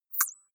click synthetic squeak bright, signale

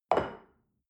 shell upside down place on table soft short; berufe; gastronomie; soundpool

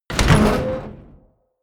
door metal open

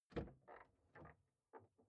car door open exterior hummer h3

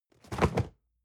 cardboard box medium fall low; objekte

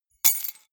key ring large drop stone hard

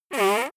nose blow

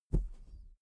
whoosh short

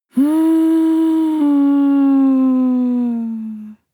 female moan whine soft; koerpergeraeusche; menschen; soundpool

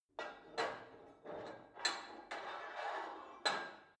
put weight onto barbell unsteady

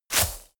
herb drop; industrie wirtschaft; bauernhof

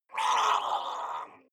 water monster female gargle deep pain; koerpergeraeusche; comedy-cartoon; soundpool

